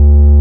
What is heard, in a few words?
laser hum